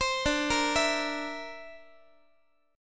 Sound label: D 7th Suspended 2nd Sharp 5th D, Guitar; D7sus2#5 arpeggiated